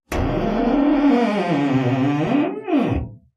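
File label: door creak large, squeaks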